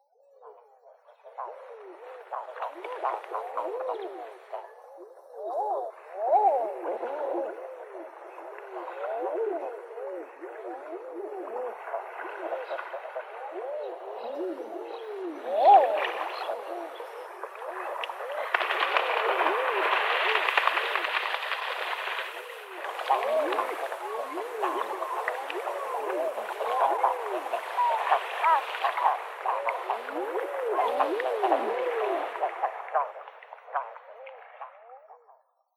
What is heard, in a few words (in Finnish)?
haahka